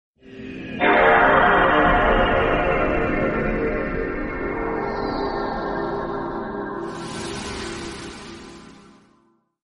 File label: ps2 startup noise